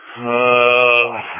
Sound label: uuhhhhhhhhhhhhh